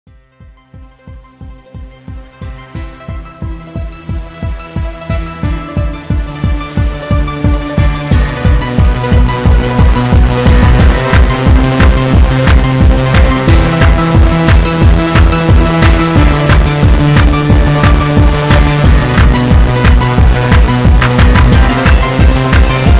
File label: piano